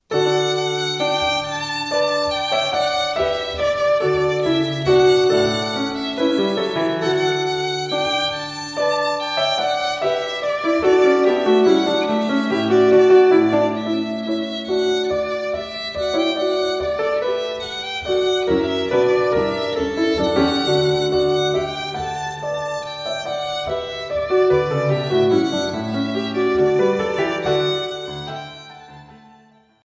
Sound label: Ode to Joy Piano Violin